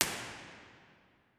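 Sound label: mono, impulse response, Lady Chapel St Albans cathedral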